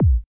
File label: kick